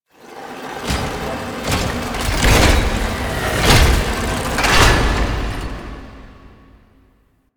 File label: Train Time Machine Mech; ambience; Warframe; Entrati